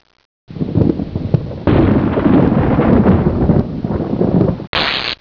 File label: Thunder; 特酷声音素材库; 自然; 雷声